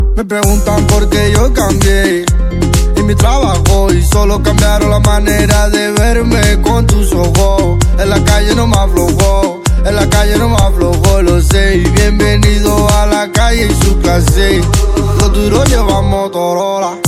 Motorola Remix Ringtone

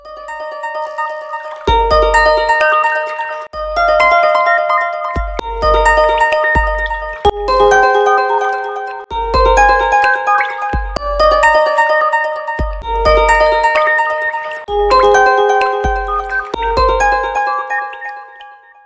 Vivo Vintage Ringtone

